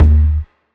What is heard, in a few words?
Not Nice 808's